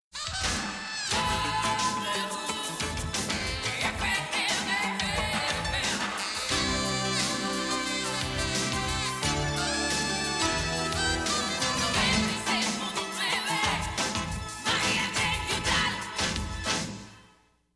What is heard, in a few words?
Jingle, radio